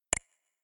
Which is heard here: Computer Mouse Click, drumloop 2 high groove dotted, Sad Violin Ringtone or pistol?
Computer Mouse Click